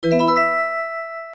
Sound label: recover; End of Aspiration; android